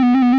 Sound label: Siren Loop; retro arcade; MMRetroArcadeSoundsPack